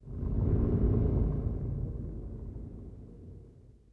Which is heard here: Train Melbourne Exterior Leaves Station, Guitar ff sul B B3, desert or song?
desert